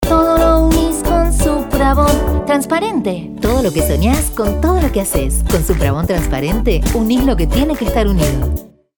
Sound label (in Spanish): radio jingle; suprabond